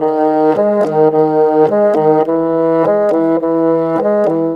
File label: Bassoons, Cinematic; Sonic Foundry (Sony Creative Software); Orchestral Series VOL-3 - Cinematic